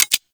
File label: CY Xbow Mech B; Tenno